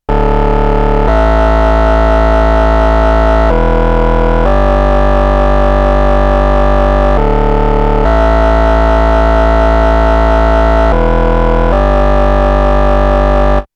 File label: Modal Argon8